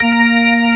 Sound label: ORG VK, B-3 ORGANS Vinta Keys B3